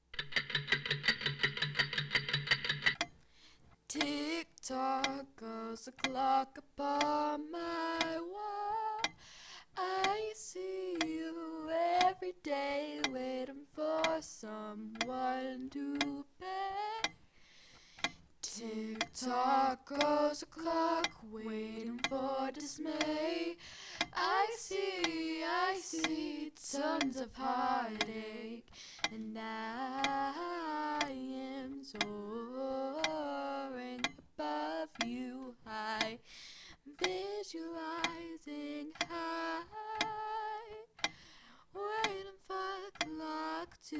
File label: Tick Tock Goes The Clock